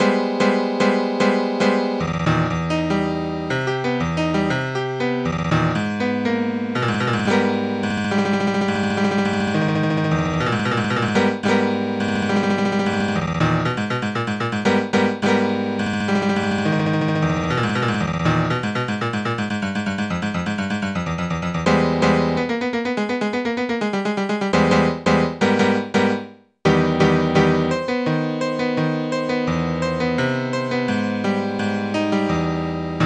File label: microsoft gs wavetable synth